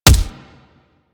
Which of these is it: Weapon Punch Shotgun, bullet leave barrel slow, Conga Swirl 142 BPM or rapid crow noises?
Weapon Punch Shotgun